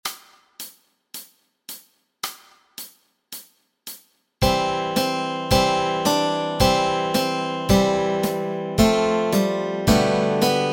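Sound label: melody and chords, Easy Guitar and Ukulele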